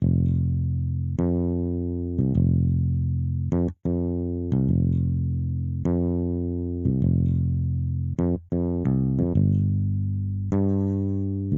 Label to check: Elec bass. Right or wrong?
Right